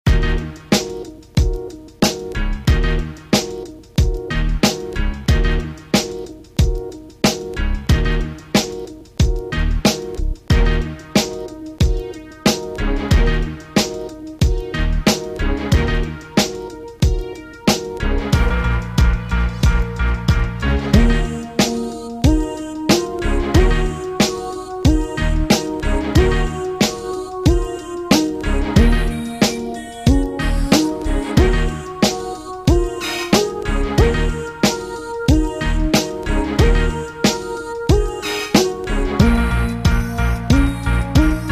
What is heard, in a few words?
Hip Hop